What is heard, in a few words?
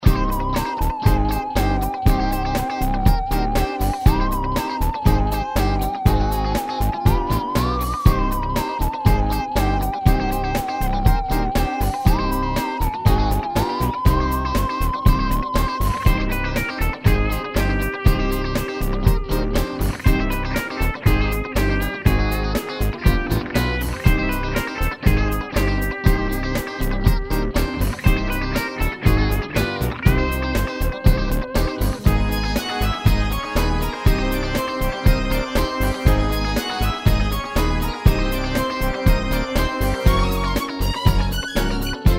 Ballroom Disco